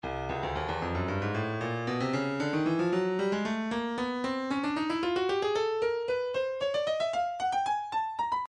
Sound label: stylus breakbeat midi files 114 bpm; Royal B